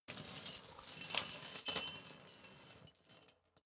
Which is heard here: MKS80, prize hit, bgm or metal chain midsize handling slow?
metal chain midsize handling slow